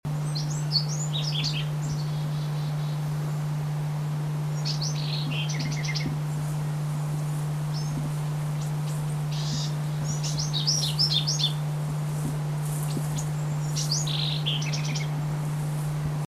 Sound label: Wren Babble